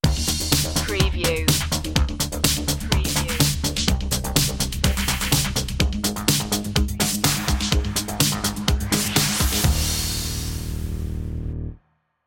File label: 80s drum and bass stinger